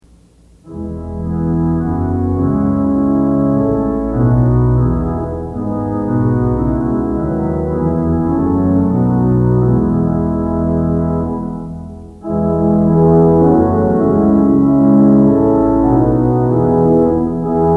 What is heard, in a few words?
Organ Music for Worship Services; O Spirit of the Living God; Twenty-Eight Liturgy Hymns